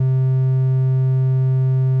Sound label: triangle